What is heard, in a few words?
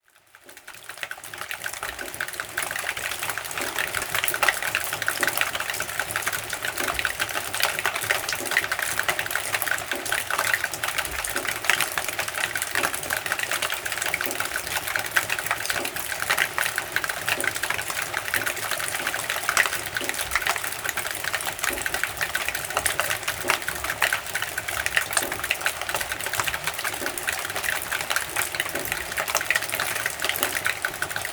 Winter Rain in Forest; Nature Sound